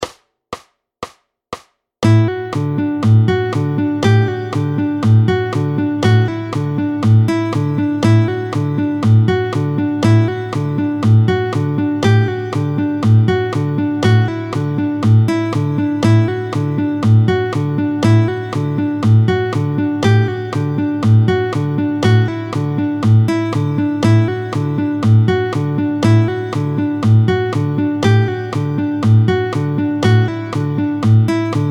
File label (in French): hammer. Vite, tempo 120